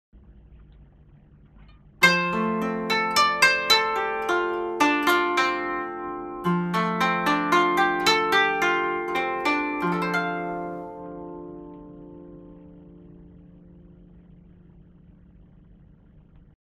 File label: koto